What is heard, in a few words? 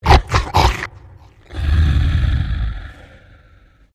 Giant Sleeping Snort; The Unfinished Swan